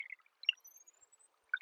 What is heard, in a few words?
Chirp